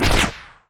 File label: shoot rocket